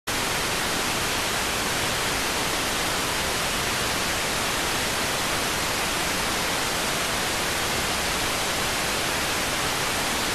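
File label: radioteletype receive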